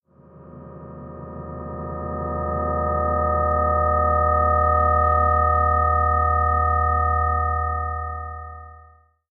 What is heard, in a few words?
fade in, ambience drone